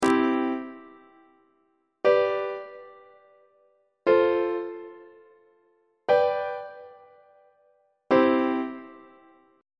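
c major inverted imperfect authentic cadence on treble clef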